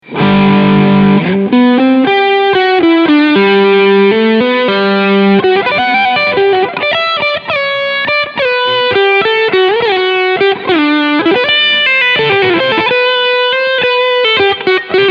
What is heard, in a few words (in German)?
DV Mark DV Little FG 250 Gitarrenverstärker Crunchsound